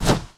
Jump

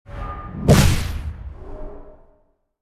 Electric Hand

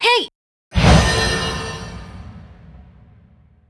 Summoner F Cast

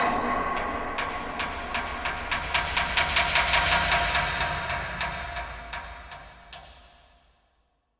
Intro Amb China

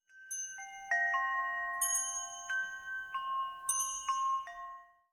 Ambience Scatter Chimes; Josh Journey Darkness Totems; Santuario

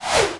Music

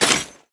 Shield steps Armor

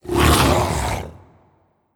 Skel Scientist Vomit

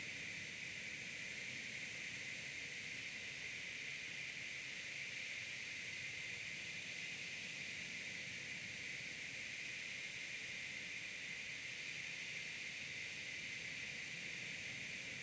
Fall Wind Shhu

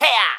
Pecheneg Archer Attack

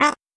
peeper

